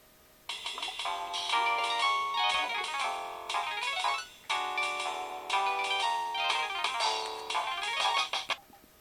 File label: samsung sghe700 ringtone